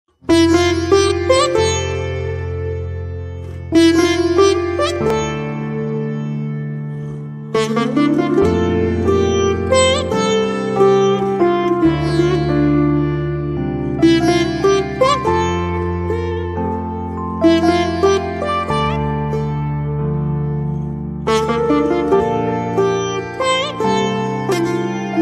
instrumental

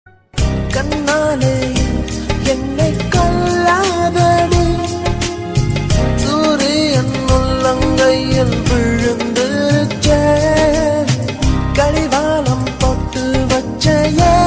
song ringtone; manmadhan movie

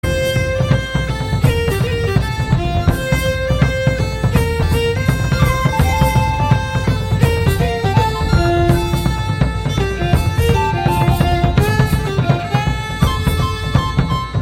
Telugu London Street Theme BGM; Jagame Thandhiram

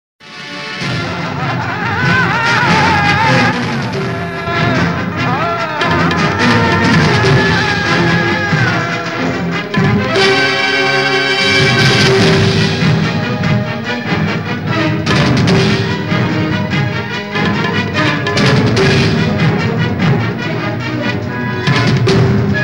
bgm low quality, malayalam movie; ustaad; rajamani

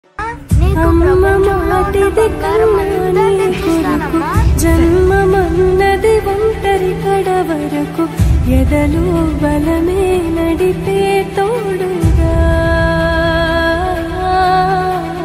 amma emotional bgm; kgf; telugu status video

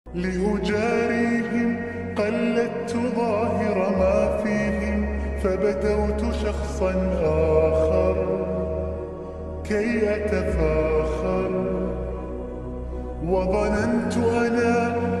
islamic ringtone

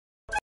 song instrumental ringtones; bhediya

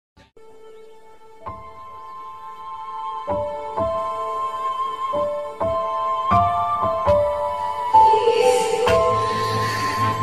tamil love ringtone

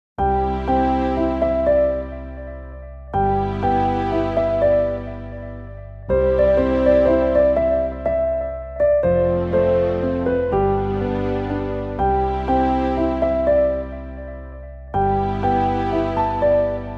bgm ringtone keyboard cover; adithya varma; azeem musical

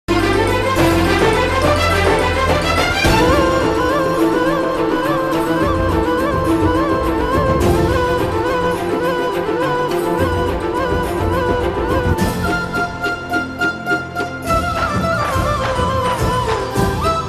radha krishna flute music dance

